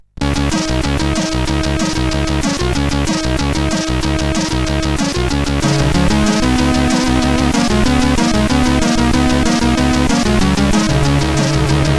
Jewels Vocal, CSG8580R5; sid

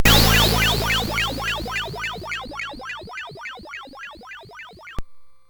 Pulse, MOS6581R4

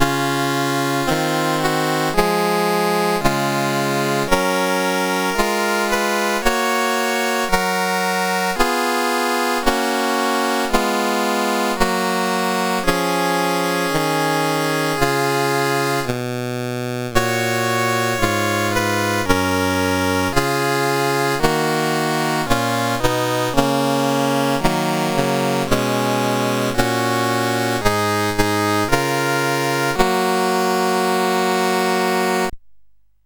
menus, sid CSG8580R5; Games Summer Edition

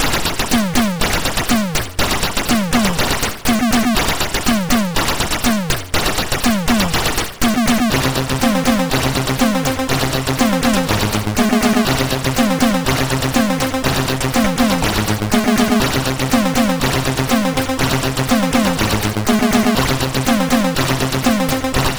Squelc, MOS6581R2; Squelc preview

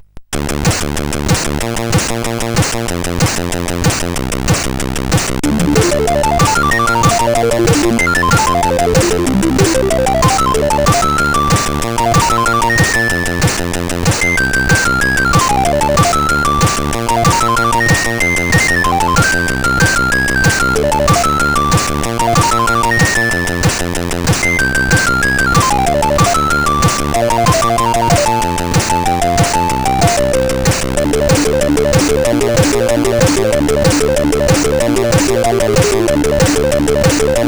RetroChip, CSG8580R5

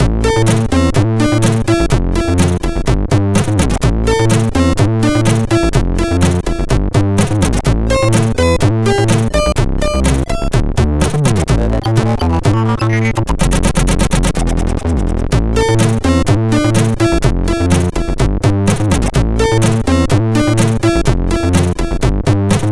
Good Bass, MOS6581R4; sid

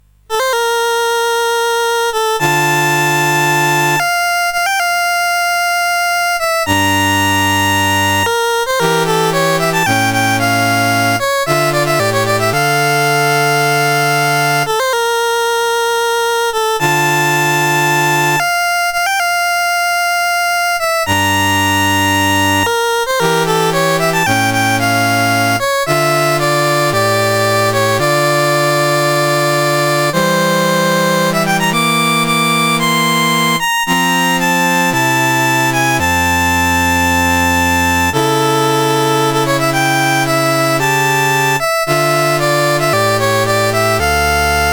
Czardas, CSG8580R5

